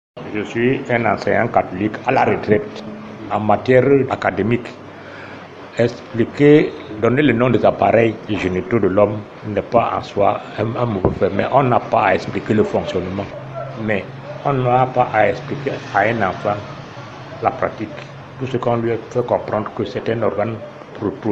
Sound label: Beat